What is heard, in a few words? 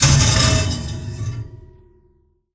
STOVE SMAS, PERCMETALIC; Rarefaction; Poke in The Ear With A Sharp Stick